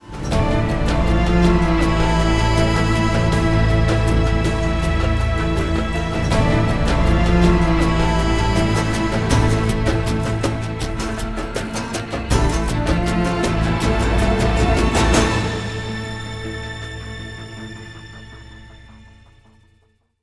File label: Match Win; Medal of Honor Warfighter